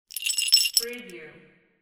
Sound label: jingle bell